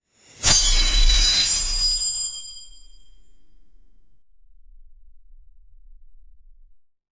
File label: sword swing sound fast; combat